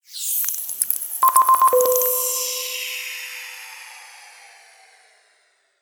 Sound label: Silicone Leak; Plastic Surgery